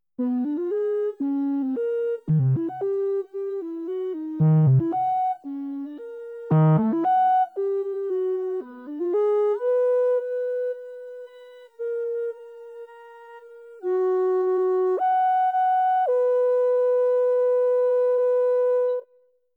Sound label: scale